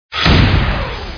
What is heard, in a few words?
Critical, Windows; Whistler